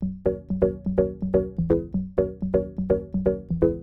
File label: Organ chops (125BPM), retro house